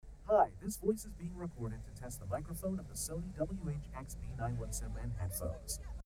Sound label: Street; sony wh-xb910n; sony wh-xb910n review